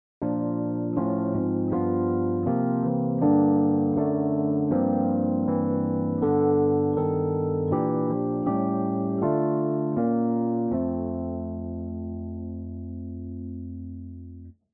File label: More Minor Passing Notes